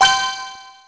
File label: Ring Of Fire; Finding Nemo; XBOX